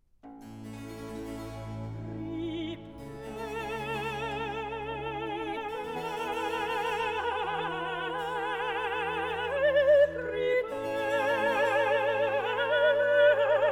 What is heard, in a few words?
Immortal Choir; Handel; Semele; HWV 58; Act 2; Prepare Then Ye